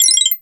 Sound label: guiro, synth guiros; Zero-G Ambient 1